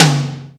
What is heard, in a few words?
tom, Yamaha TG-500